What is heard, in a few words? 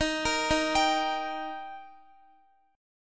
Eb Major Eb down Guitar 4E,4A,5D,5G,5C,6F; arpeggiated